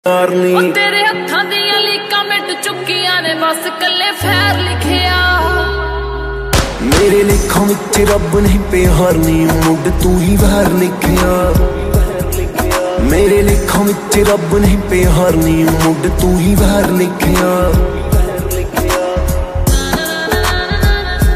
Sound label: song ringtone